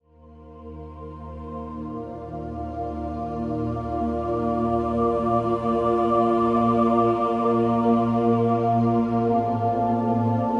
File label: Spiritual Music Meditation Music